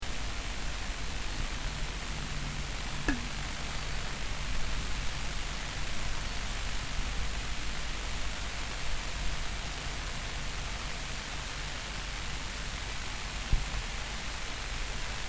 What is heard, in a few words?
Pylon Fizzing; Fizzing pylon, Chopwell Woodland Park, Gateshead, England, UK in July 2024; I was on a walk in Chopwell Woodland Park which is on the fringe of Gateshead, and I came across this beautiful pylon